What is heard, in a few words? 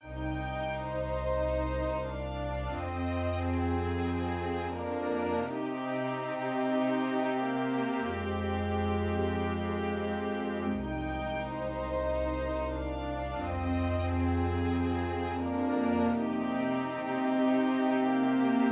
Vermona DSR 3 Strings